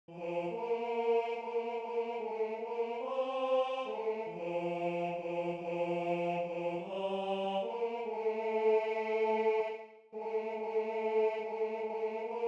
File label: Tenor II; Untreue